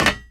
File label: metal land; firefight